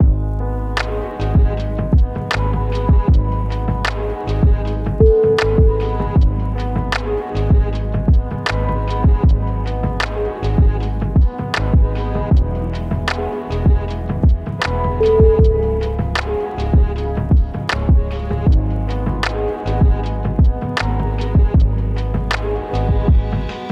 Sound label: autumn drama